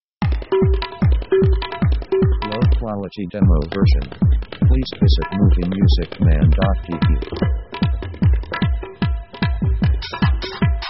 Mumbai on the move; Action themes

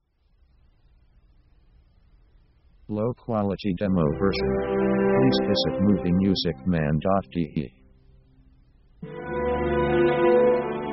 Emotional

